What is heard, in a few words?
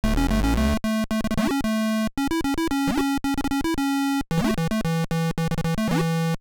GAME START; Food Fight; Atari 7800